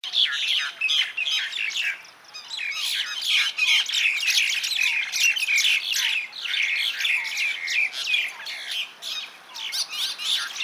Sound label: Acridotheres tristis; Chordata; LandBirds